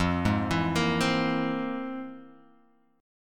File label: F Minor Major 11th, Guitar, Open G